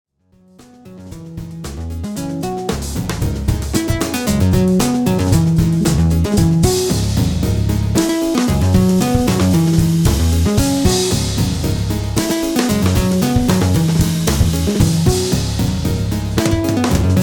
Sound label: Performance Segment